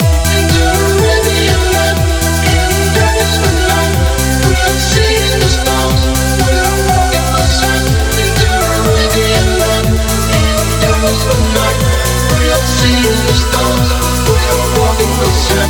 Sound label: Arabian Land